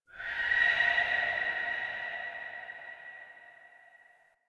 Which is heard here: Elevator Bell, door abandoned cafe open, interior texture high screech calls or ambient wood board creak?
interior texture high screech calls